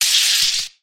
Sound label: sparking lightning; soundscrate